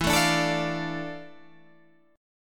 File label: D Minor E, chord; Dm/E strummed